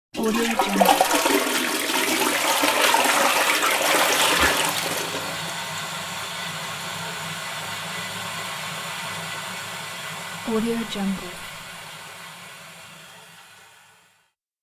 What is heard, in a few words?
toilet flush